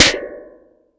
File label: dirty snare sample a sharp key